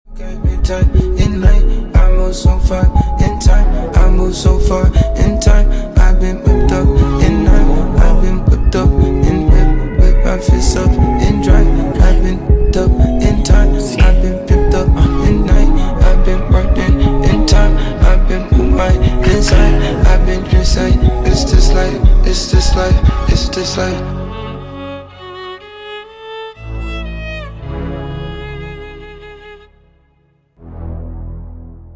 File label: Trance Clean